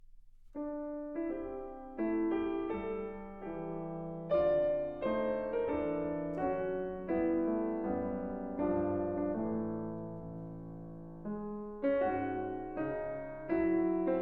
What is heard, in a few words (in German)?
Sonate fur Horn und Klavier